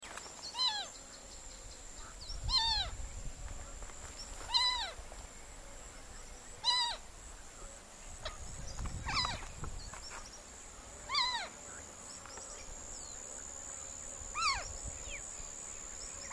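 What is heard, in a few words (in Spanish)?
pollona celeste, aves; cantos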